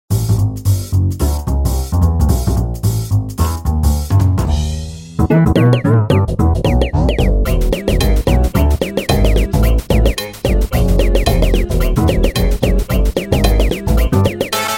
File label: dog bass